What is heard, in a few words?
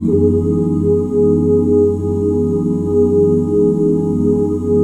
GB SUS13 OO, OOO CHORDS; Spectrasonics; Vocal Planet; Jazz FX